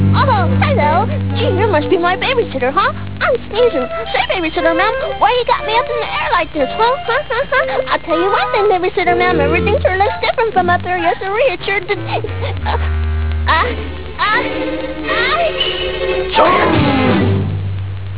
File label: sneezer